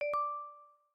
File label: Silent mode off Calm; Samsung; Galaxy A20